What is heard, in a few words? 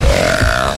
burp; garrysmod